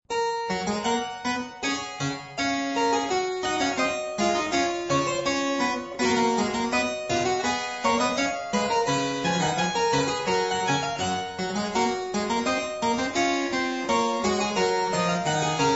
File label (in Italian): Canone alla Sesta a 1 Clav.; Variatio 18